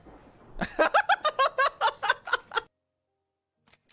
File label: laugh